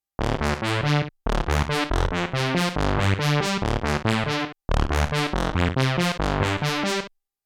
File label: Prophet harmony; AMS dmx 15 80S